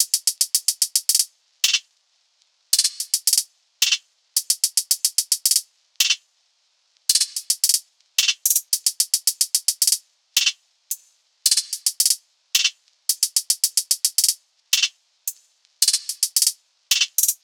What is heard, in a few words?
hats 110, Hat Loops; drum sample pack; Azufre; SEISMIC; Slate